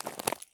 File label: walk slate; Escape from Tarkov